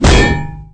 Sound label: tank Coll; Action; ThinkTanks